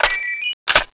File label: reload, weapons; tfa cso